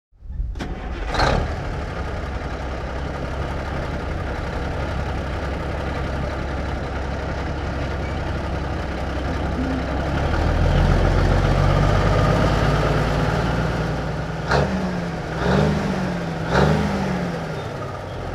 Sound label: 1954 Big Rig Ext Start Idle Away Slow Revs, Period Vehicles; Sony Pictures Sound Effects Series Volumes 1-10; Sony Creative Software